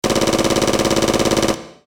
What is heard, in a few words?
battle gun; maoudamashii